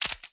pistol up reload

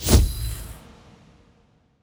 dark hole token dead

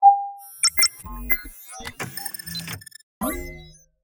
system start

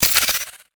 uav ice

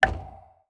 wooden fish

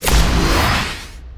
mech axbow aim boost

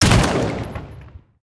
atk heavy gun

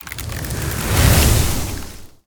vine die

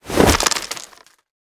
weiqi drop

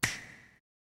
snapping

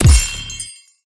mag bell

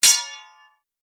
sword tsing

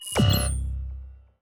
teammate lose

